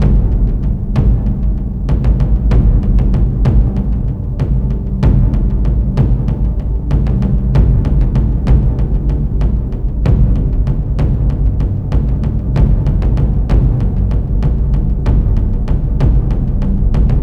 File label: Menu Loop; Carmen Sandiego The Secret Of The Stolen Drums; XBOX